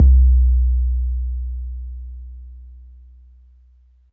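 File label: bass drum 808 MPC60 Smooth B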